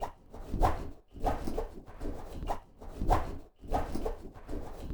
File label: RUSH; Spectrasonics; Liquid Grooves 1 & 2; ODDS + ENDS